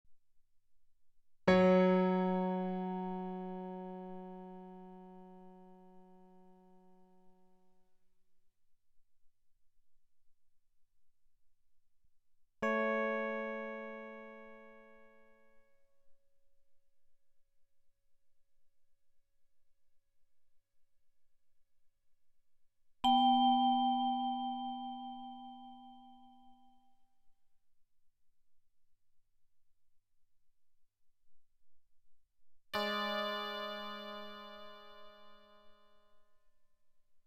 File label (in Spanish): intervalos abiertos